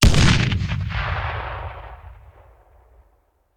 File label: Grenade Hand; Sound Ideas; Guns